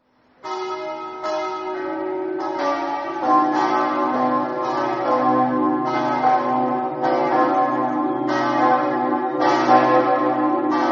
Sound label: campane